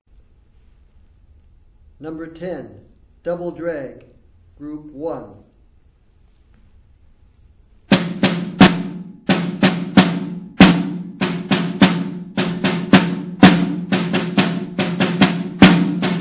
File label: snare rudiment